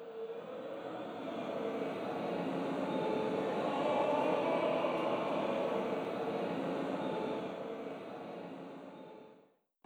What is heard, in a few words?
Creepy Chorus; The Children of Clay